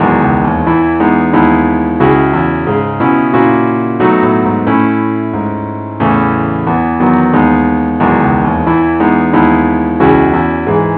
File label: keyboard only key E